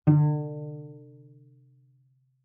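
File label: Cello pizz fortissimo sul G D3; MIS Pitches - 2014; Strings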